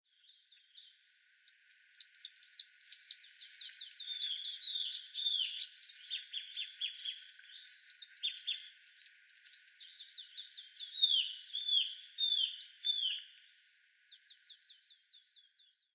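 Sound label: marsh tit, muroran; koe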